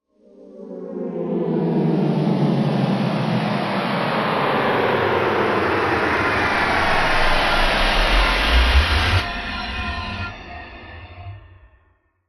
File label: Nebula Tone Shift Down